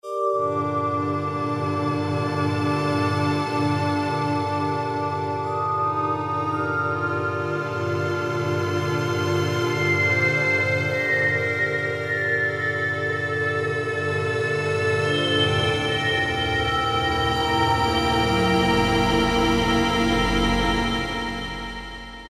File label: Glace, Yamaha TG33